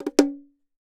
One Shot Hybrid Bongo Caribian Filler Percussion Bongos Drums Island Future Afrobeat